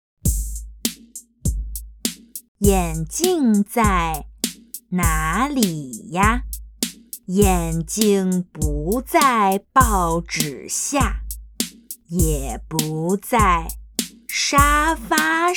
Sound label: Chant